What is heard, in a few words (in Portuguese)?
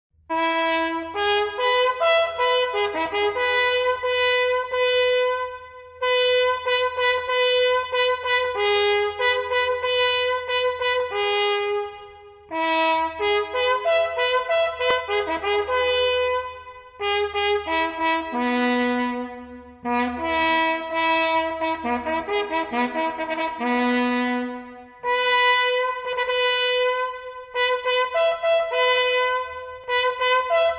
Toque Recolher